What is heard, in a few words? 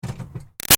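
Car Highlander Gear Shift Exterior; 2005 Toyota Highlander V6; Cars; Vehicles; BigRoomSound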